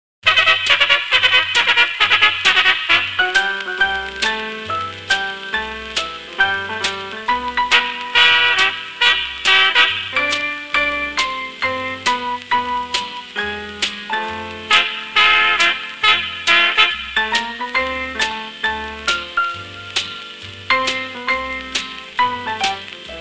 make believe two step (instrumental)

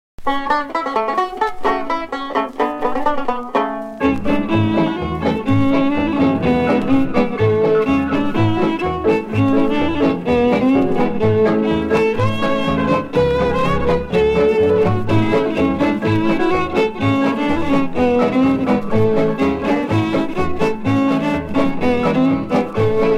wildwood flower (instrumental)

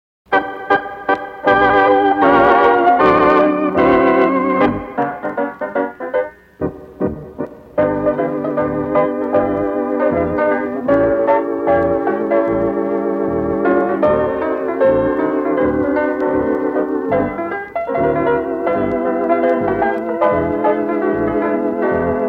schottische instrumental; oklahoma mixer

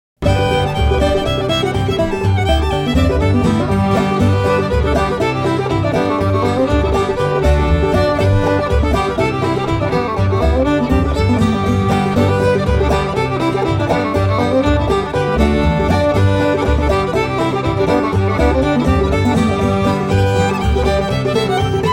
instrumental

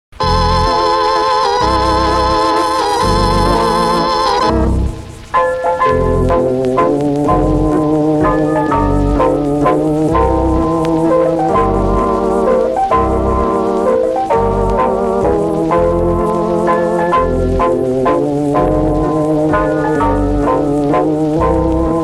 mazurka (instrumental)

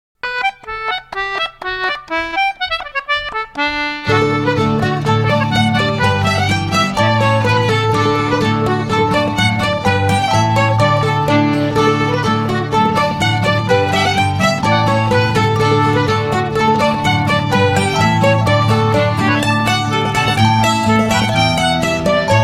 instrumental

